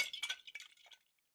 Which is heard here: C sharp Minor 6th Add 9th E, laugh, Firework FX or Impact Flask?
Impact Flask